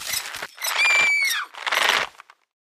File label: pull Rope; jackhammer 2